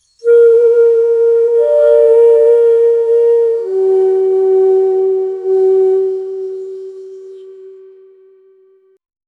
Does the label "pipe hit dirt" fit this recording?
No